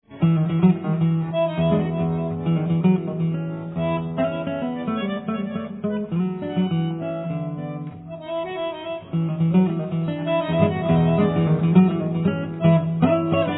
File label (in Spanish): milonga